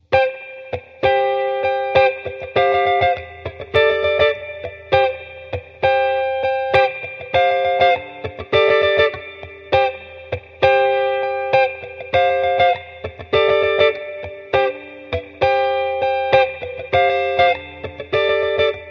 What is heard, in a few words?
distortion dry